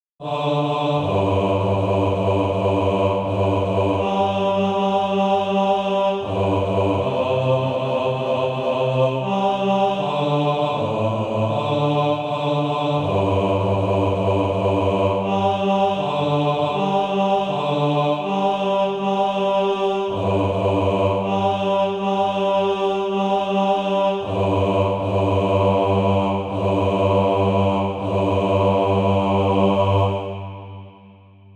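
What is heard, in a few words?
bass; Eternity; Crosby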